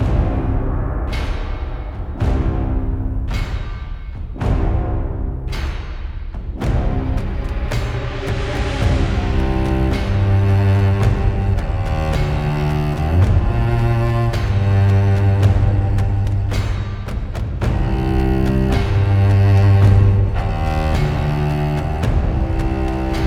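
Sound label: background music preparation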